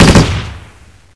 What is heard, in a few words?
hmg 3 rounds, weapons; hl2